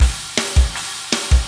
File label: metal